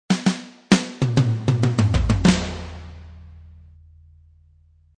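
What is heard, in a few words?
Loop Drum Fill 98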